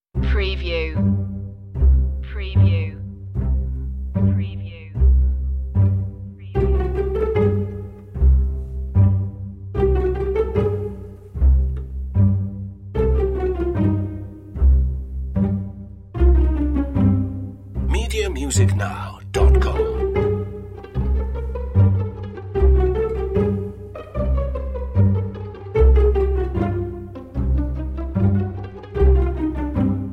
strings only

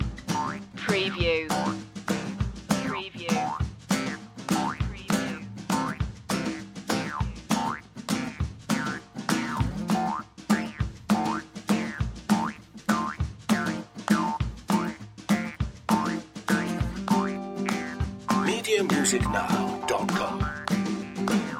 stomp loop

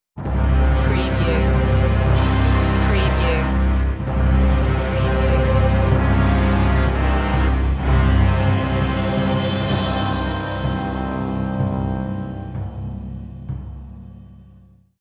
sting no choir

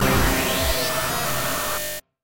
Hit Glitch

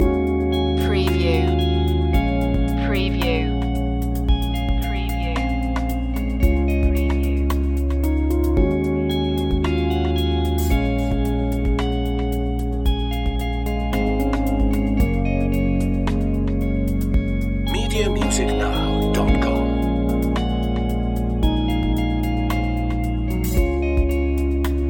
stirring spirits loop

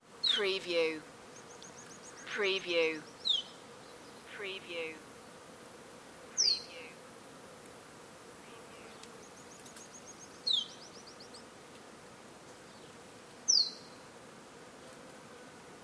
rainforest ambience

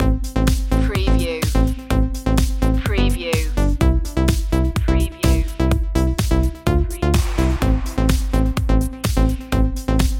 trance

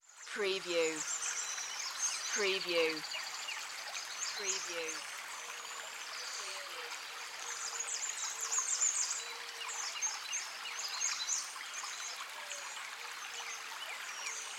Birds by the Creek

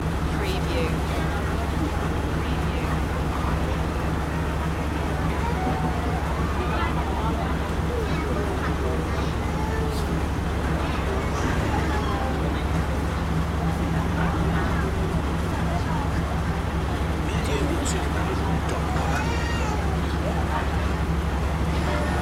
train ambience thailand loop

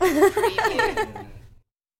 2 Ladies Giggle

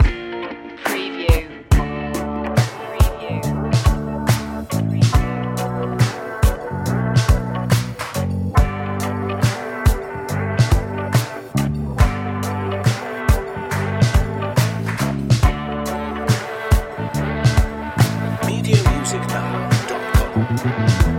smooth groove

